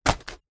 foley footstep single barefoot walking cardboard; zapsplat

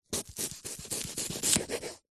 food apple slicing with large knife

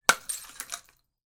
impacts glass bottle smash; martinimeniscus

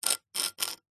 foley rock concrete knife blade scrape scratch; zapsplat

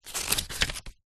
household book very small page turn; zapsplat

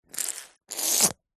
human hands wet squeeze together

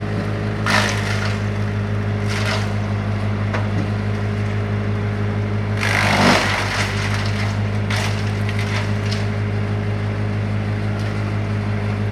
industrial machine wood chipper vermeer bc150; sound effects one

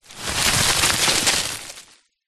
foley dirt collapse fall roll small pile; zapsplat

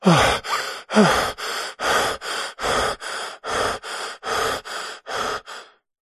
human male breathing fast gasping; zapsplat

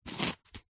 foley polystyrene foam rub scrape short; zapsplat

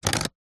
leisure toy plastic slider toggle slide

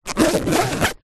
foley small pouch bag rigid fabric zipper; zapsplat

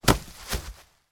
impact log branch fall hit forest floor

